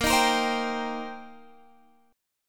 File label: Eb 6th Bb, guitar